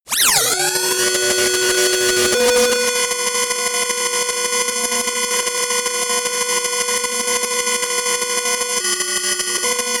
sci fi swell evolve; atmosphaeren umwelt

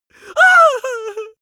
male afraid whimper; vocals; comedy-cartoon; soundpool

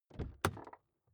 car door open interior hummer h3

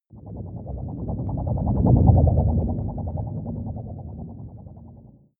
whoosh storm wobble hard long; swooshes-swishes

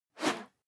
whoosh whistle; swooshes-swishes

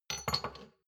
shot glasses stack soft short; soundpool

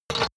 shot glasses place multiple medium; berufe; gastronomie; soundpool

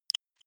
click digital; maschinen; signale; soundpool

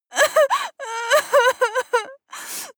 female cry; koerpergeraeusche; menschen; soundpool

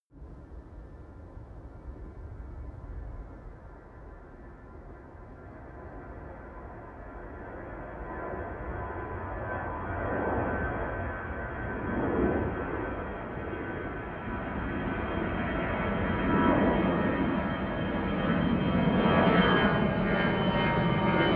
plane flyby land tonal howl; flugzeuge verkehrsflugzeuge; verkehr transport

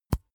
snap cheek single; koerpergeraeusche; menschen; soundpool

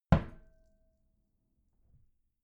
wooden door close soft glass clang

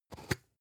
spice jar big scratch open

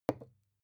glass put down heavy; objekte

